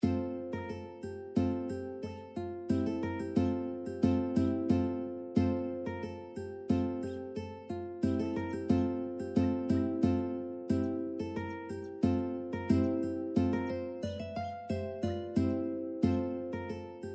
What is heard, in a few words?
Deck The Halls, ukulele